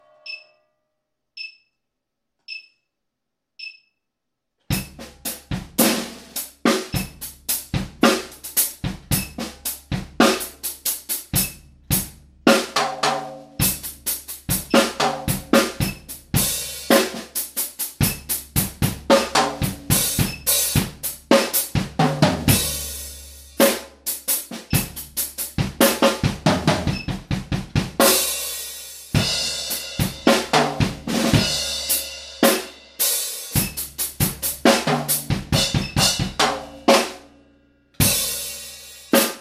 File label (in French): impro quintolets